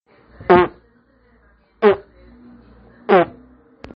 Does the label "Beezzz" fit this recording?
No